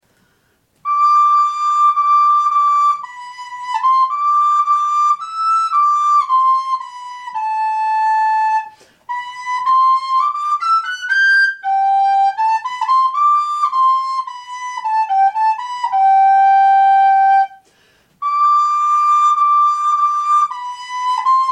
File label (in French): flute